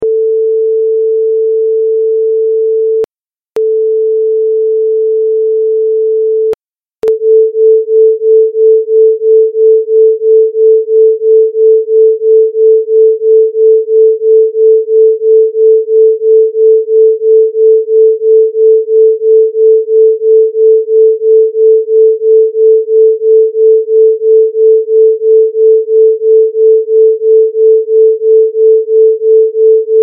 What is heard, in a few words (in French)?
Interferences